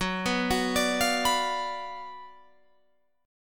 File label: F sharp Major 7th Suspended 4th Sharp 5th, Guitar, Open F; F#M7sus4#5 Chord; arpeggiated; 18,18,18,21,20,18